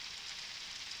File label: Background Noise